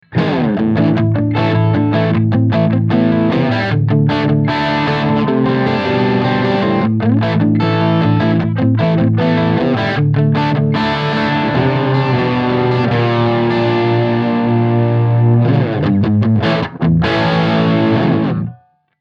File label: double crunch rock